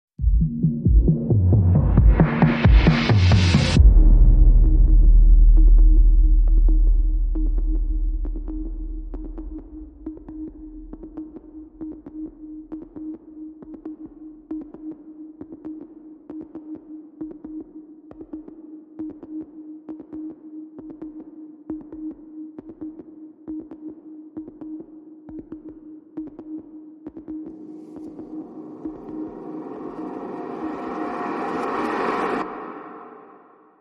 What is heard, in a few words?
Drums Synths and SFX Only